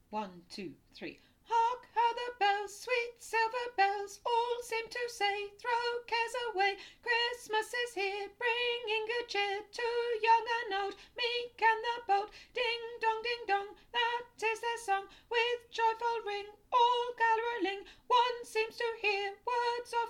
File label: CAROL OF THE BELLS SOPRANO